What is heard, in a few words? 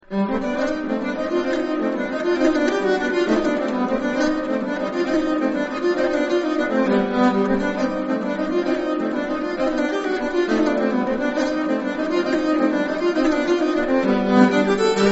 nyckel, nyckelharpa